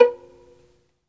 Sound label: SOLO VI, Synclavier Strings; ILIO